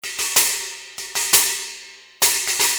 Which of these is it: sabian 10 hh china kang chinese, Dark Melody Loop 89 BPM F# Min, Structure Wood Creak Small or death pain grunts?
sabian 10 hh china kang chinese